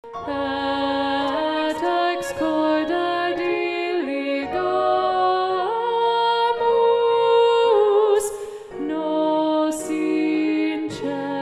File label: Ubi Caritas SSAA Alto 2b Predominant